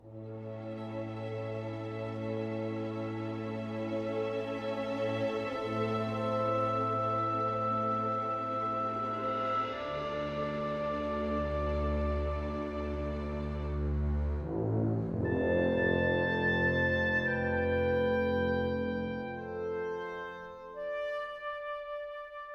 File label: World Music